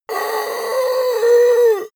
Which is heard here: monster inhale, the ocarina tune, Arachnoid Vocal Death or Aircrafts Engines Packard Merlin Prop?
monster inhale